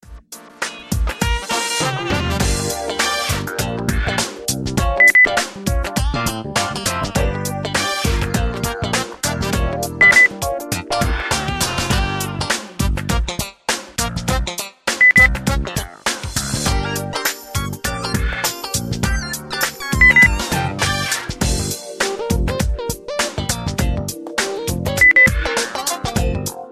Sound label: groovy